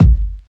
fluffy kick single shot g key, Royality free bass drum tuned to the G note.